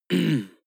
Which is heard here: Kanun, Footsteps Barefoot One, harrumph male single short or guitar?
harrumph male single short